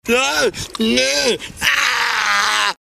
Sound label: Scream Ringtone